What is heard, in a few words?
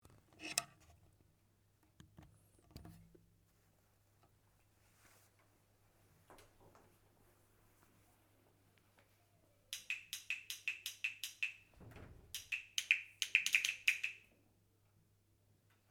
Havre Crickets